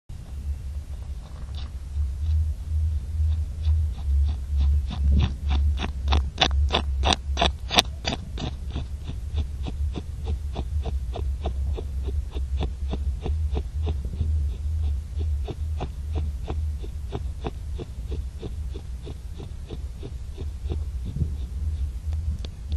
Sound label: Wetzen whetting, biber